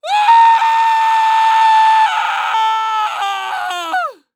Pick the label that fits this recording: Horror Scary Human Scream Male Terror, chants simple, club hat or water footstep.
Horror Scary Human Scream Male Terror